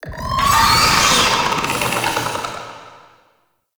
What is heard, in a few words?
Summon Vocal Verb; Warframe; Uriel; Gulphagor